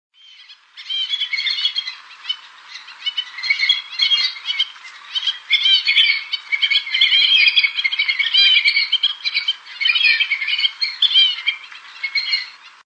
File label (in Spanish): sirirí colorado